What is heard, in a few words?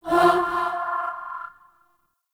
Cast; Warframe; Warframes; Bard